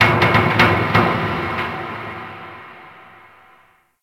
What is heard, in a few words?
Ocean Underwater Metal Creaks Large; Warframe; Grineer Ocean